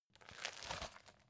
folder file jacket turn

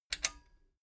metal button stereo click disable fast

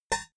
typewriter single

